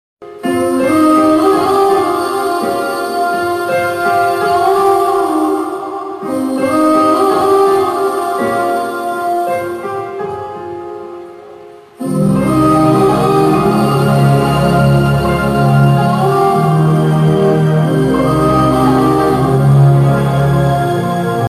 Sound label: sad bgm ringtone; rrr movie